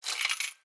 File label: win, baccarat; baccarat2